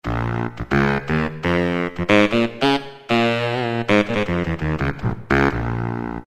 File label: Baritone Saxophone, Roland PN-D50-03